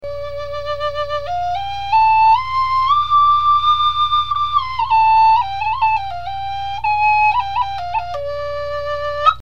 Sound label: pocket, flutes; Bass Flute; windflowerflutes